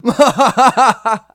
man

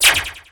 bend

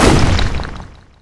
blast

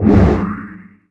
mimic

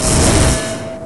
charge

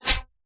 blowgun

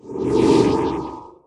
haste

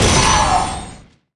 crystal

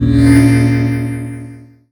phase

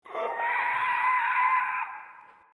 zombie screaming

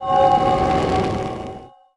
ring

